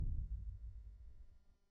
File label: heavy ground thud